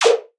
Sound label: synth hit room tone, A#; Virtual Riot